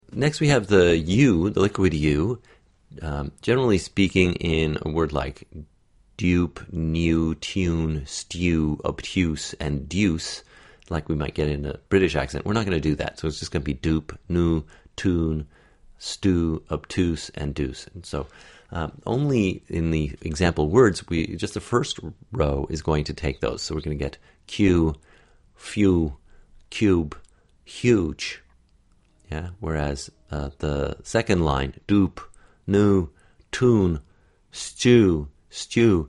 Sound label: MUSIC